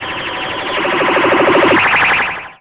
Alien FX; Sonic Foundry (Sony Creative Software); Underground UK House & Electro

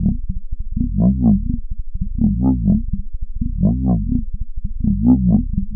Noise, LFO & Noise

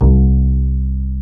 PIZZ BASS C1, Pizz Double Bass

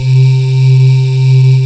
male vox C3

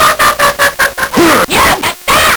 Nintendo Gameboy LSDJ

